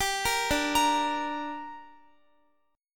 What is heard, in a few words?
D Suspended 4th Sharp 5th D down Guitar, chord